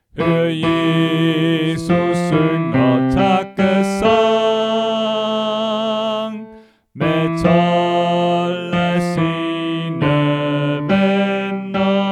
Bas; Hør jesus synger takkesang; oevefiler

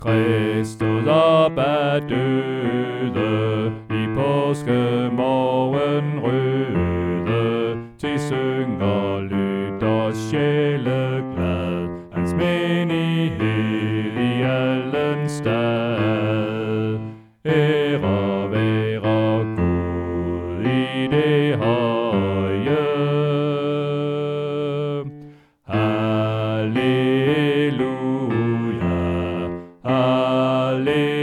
Krist stod op af døde Bas; oevefiler

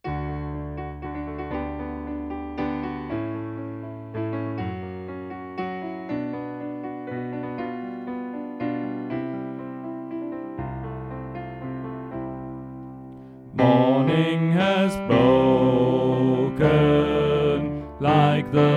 Bas; Stephans-koret; oevefiler

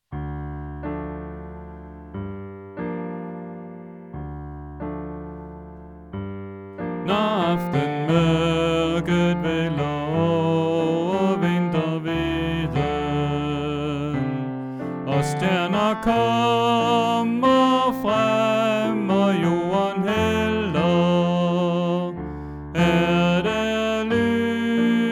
Tenor Bas; Naar aftenmoerket vaelder; oevefiler

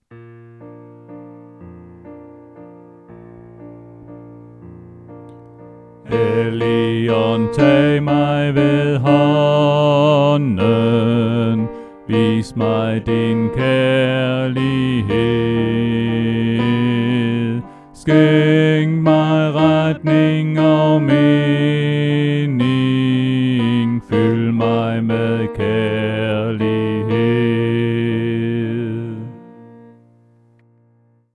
Helligånd tag mig ved hånden Alt; oevefiler

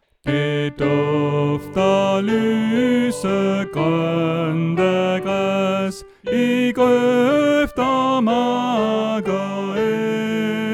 Sopran2; Sommersalme; oevefiler

